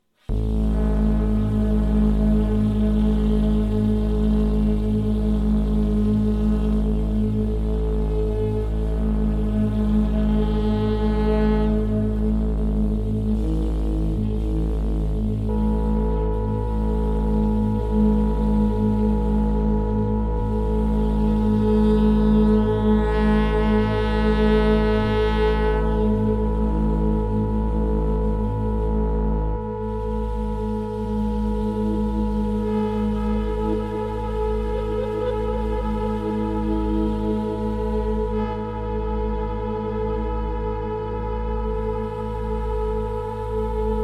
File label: Morse 20